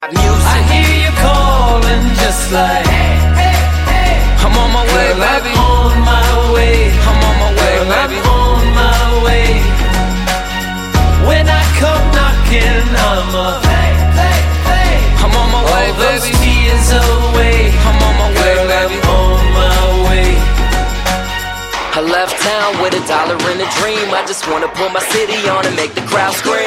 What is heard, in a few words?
RAP